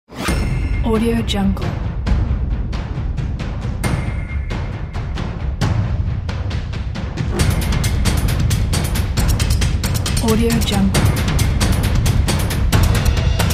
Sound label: epic industrial drums and percussion